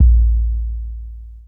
Kick B Hit, Bmin; Hip Hop; Hip Hop Exotica; Apple Loops; Big Fish Audio

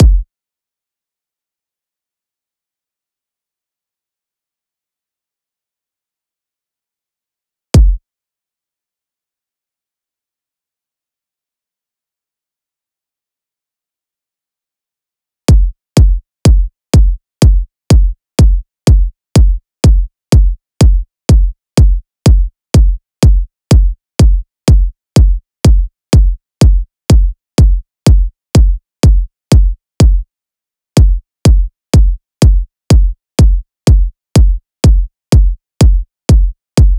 Kicks, Future House 124 BPM; Big EDM; Future House Tools; Construction Kits; Cracker

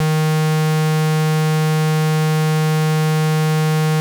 square, 808, SubOsc

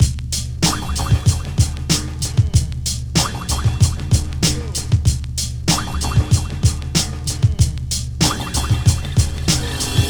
Beat 095, Hip Hop

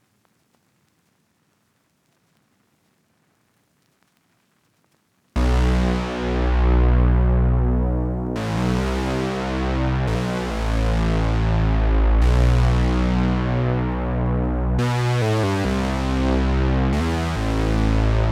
140 BPM B Min Bass; Cymatics; Destiny Gold Expansion Stems; Analog Nightmare

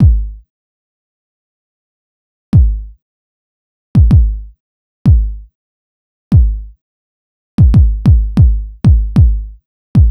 Kick; Hip Hop Exotica; big fish audio; Apple Loops; Drum Tracks

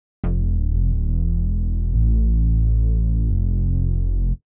Bass, Hip Hop Exotica, 088 Emin

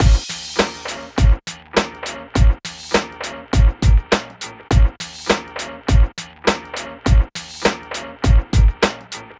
Drums Synth Combo, Hip Hop, 102, Bb min

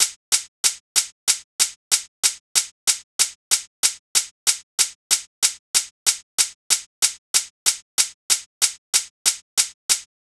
Hat, 094 Bmin, Drum Tracks; Hip Hop Exotica; Big Fish Audio

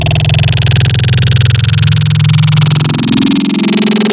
Growl Table, Serum; Cymatics

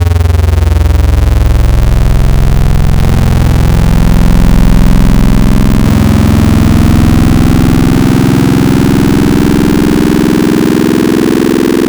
Bend Multi, Wavetables; Cymatics; Wavetables Collection Vol 3; Serum

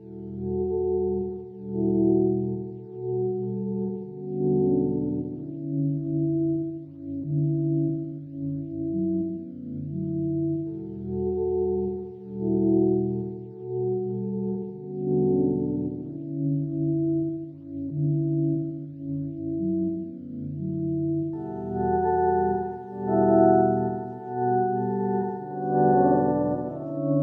90 BPM B Min Pad; Pad 2; Cymatics; Destiny Gold Expansion Stems; Intermission

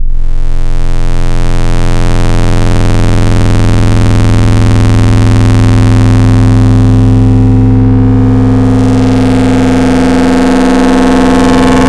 FM Saws, Wavetables; Cymatics; Future House Wavetables; Serum

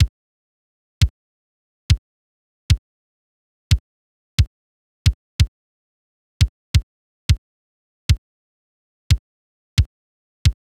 Kick; big fish audio; Hip Hop Exotica; Apple Loops

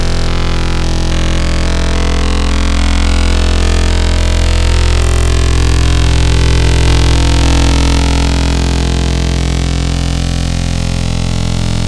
Growl Wavetables; Death B4 Dishonor; Cymatics; SERUM; Virus Wavetables

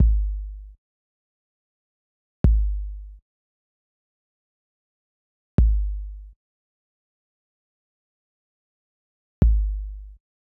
Kick, 086 Cmin, Drum Tracks; Hip Hop; big fish audio; Hip Hop Exotica; Apple Loops

